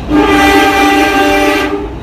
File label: klaxon, Train; R220 MUE5 - 6 Car (Alstom) 2019-Present